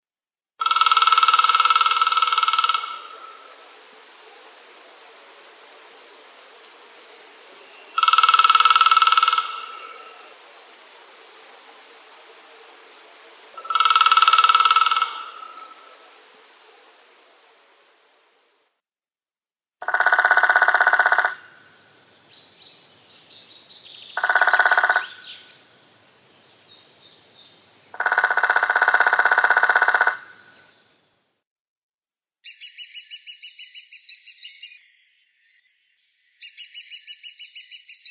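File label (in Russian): Dyatel malyy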